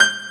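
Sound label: PIANO ROOM G5; AKAI S6000 CD-ROM - Volume 1; PIANOS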